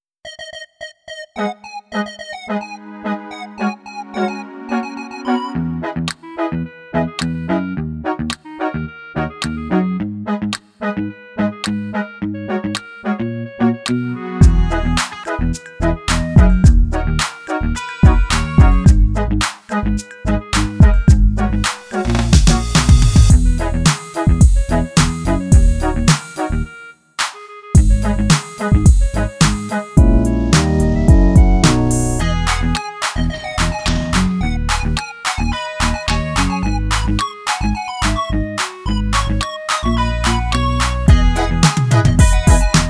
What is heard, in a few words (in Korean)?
beat drum, 멜로디MR -1키